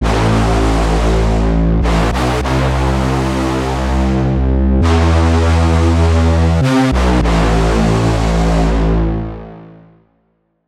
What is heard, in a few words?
Brass Stacked, bass synth; Wave Alchemy - Bassynth 1.1.0